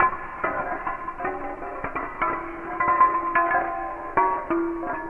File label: Slow Glass Loop